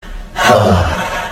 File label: sigh